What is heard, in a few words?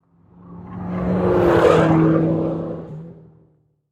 Race Car Pass By Fast; Power Car sounds; Car Sound Effects